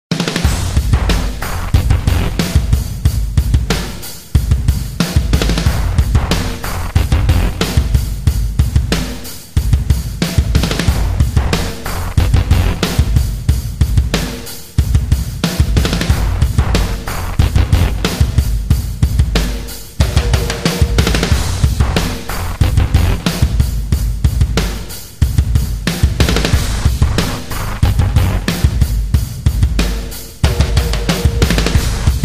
Drums & Sfx; minions 3b

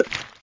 Pop

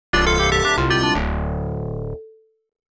Wave Complete; Off the Wall

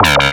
KEY Hold Finish

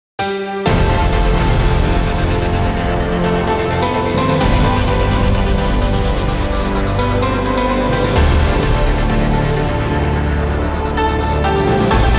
Victory; Soldner-X 2; System Liberated

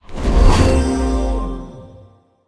Score Whoosh Win

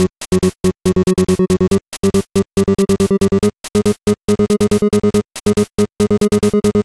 Story Tower Slide; Adventure in the Tower of Flight

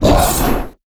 Boss Attack

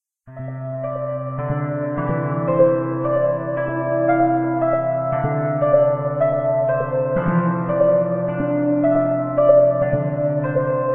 Piano Piece; gamerip; door to door

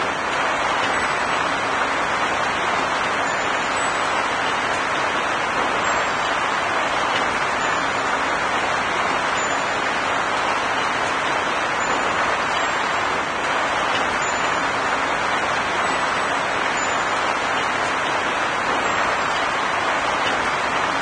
Rain with Tones; Crystal Catacombs

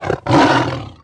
tiger angry; Ringling Bros. and Barnum & Bailey; Wii

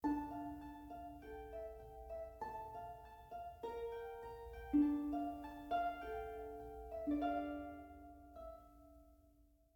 harp; Quivr; PS4 Windows gamerip 2016

